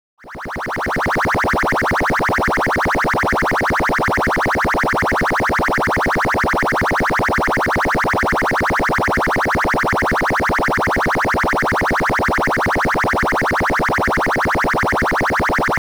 charge

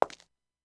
Footstep high

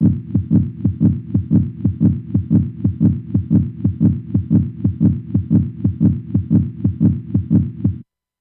HeartBeat long fast

